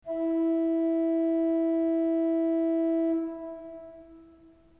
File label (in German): ton, orgel